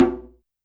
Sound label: Djembe Edge; YAMAHA RM1X